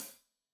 Hat Loose, Hi-Hat, Cymbals Stereo

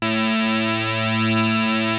PPG A2, Synthesizer; Hollywood Edge; Giorgio Moroder Rare Synthesizer Collection; PPG.2.3

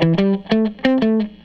Les Guitar Loop

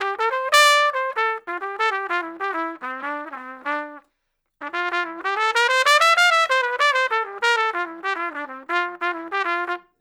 Trumpet Solo, Jazz Traditional; Sonic Foundry (Sony Creative Software); Jazz Solos and Sections

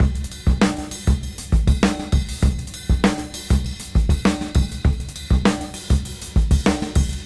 99 BPM; AMG; Keith LeBlanc Phat Butt Jams

